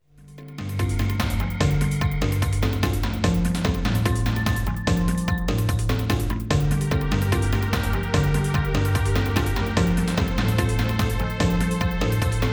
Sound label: Danger Level; Haven Forest; Jetboard